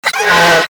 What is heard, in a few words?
creature honk funny